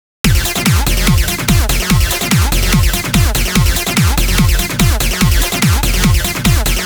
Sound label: Acid lowcut